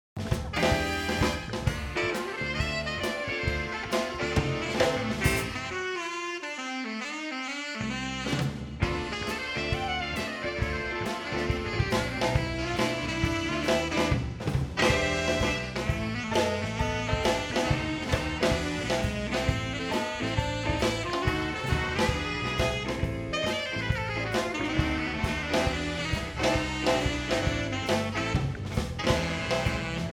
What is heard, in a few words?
Plays Alto Sax